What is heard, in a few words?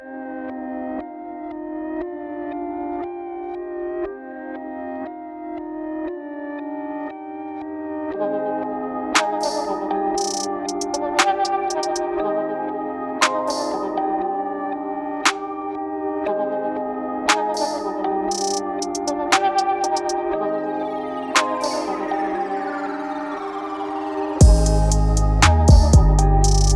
Bass